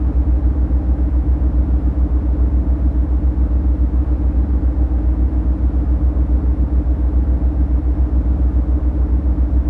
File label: flying; spacemap